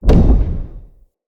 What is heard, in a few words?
Casting Device Impact; Weapon Impacts; Casting Devices; Galene